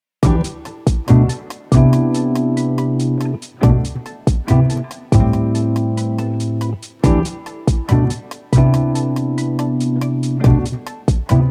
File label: Brazil Beat